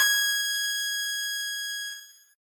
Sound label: piano; pianojs